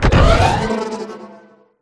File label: boost; lfs; vulturedroid